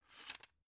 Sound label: drug blister pull out; berufe; arzt